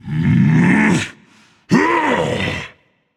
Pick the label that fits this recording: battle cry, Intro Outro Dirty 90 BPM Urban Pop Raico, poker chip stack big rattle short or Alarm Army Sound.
battle cry